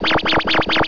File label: GUN; Death from Above